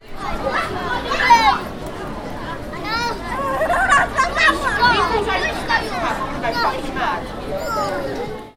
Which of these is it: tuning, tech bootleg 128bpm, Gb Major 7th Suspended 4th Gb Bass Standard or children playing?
children playing